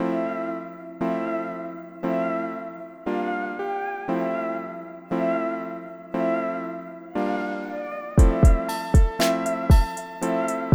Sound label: Bar Song INN; GRINDSTONE BarSongINN